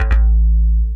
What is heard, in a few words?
dance, bass; Ueberschall; Techno Trance Essentials